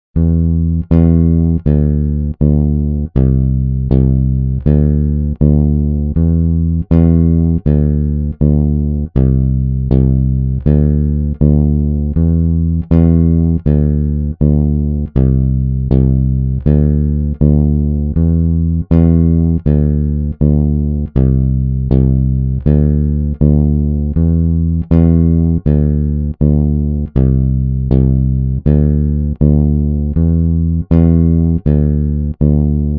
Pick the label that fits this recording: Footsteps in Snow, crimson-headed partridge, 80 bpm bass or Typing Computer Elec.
80 bpm bass